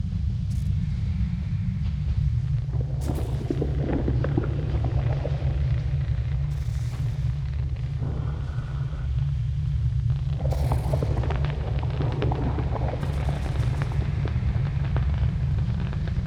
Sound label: ambient space storm; System VFX